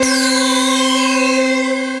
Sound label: Synth Lead